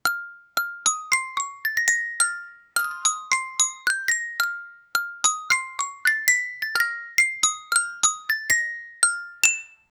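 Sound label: cheerful playful instrumental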